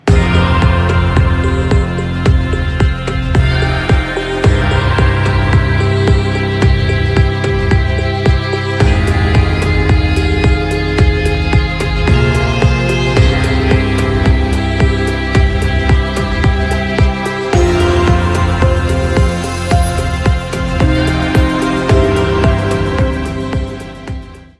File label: suspicion